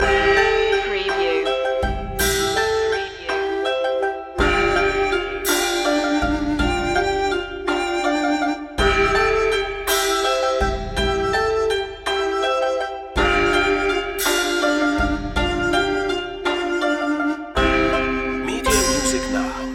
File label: chinese waltz loop